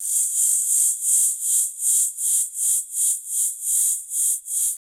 static floor hiss; sound design

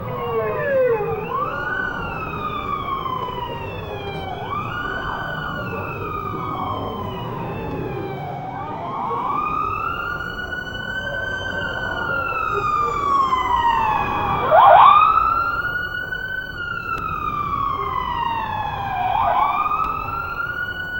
crime scene ambient sound

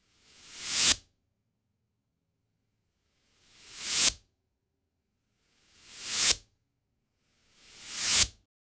left to right slide with, motion

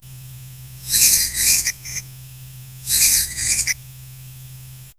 soft stitching light thr, sewing; sound effect

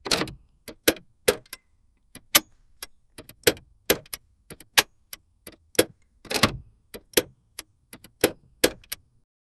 mechanic car lock, automotive

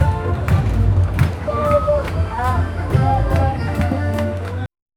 upbeat festive background, festival

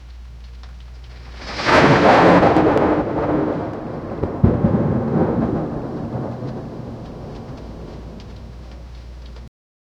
heavy rain crashes down; boots

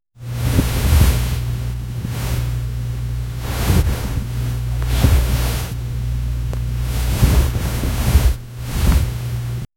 romantic cuddle ambience, intimate

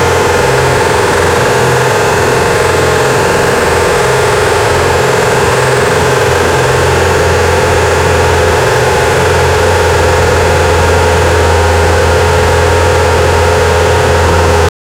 formula f1 v10 engine sound; motorsport

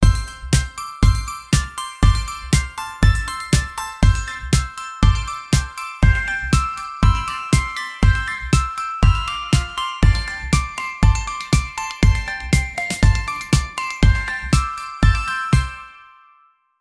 valentine love ringtone

